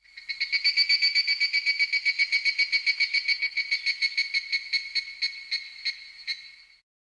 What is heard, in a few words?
Evening cicada, ringtones; Fujitsu F-42A